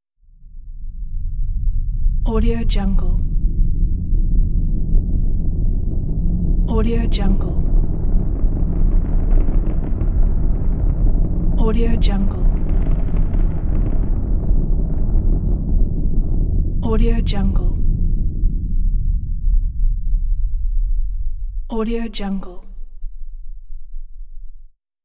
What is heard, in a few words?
avalanche from inside building